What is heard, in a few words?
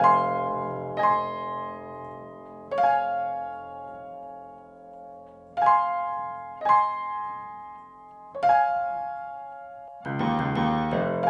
Piano, 70s Analog Funk; Sonic Foundry (Sony Creative Software); What it Is! 70s Analog Funk